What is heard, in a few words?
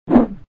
whoosh; Electricman 2